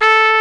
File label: TRUMPET A3; Trump TrombCombo